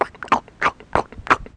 munchy, creature; gfactory

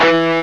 Dist Guit (E2); X-Wing; X-Wing Patches

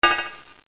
building; NETSTORM

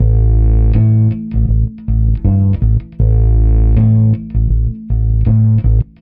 Reggae, Bass; ACID MUSIC

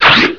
gun; Deers Revenge

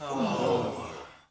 boo near miss; Arcade Pool Demo

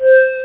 synth; 17 Bit Software 2: Collection B

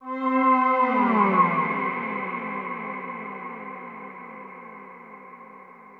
synth pad ambient 160 C; Sphere

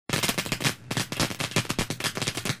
sparks; WMBonusXP

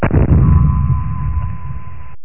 Shoot

